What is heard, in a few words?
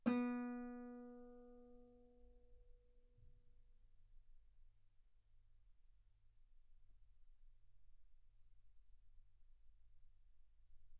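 Guitar ff B string B3; sul B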